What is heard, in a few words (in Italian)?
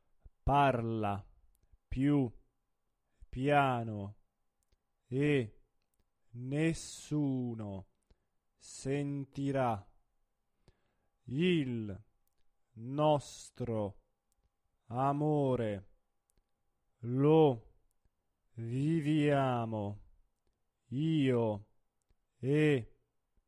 Parla piu piano (monotono)